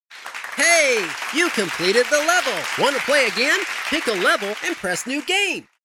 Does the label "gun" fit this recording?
No